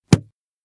book close

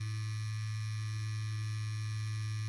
vibrator low

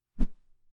woosh

